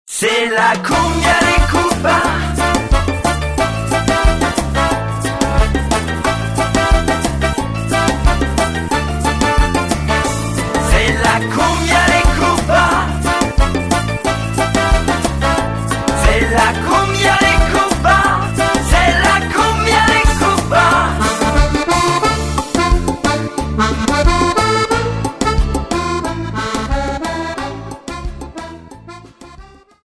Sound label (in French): cumbia